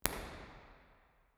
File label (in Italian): IR misura